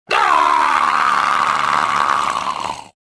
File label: falling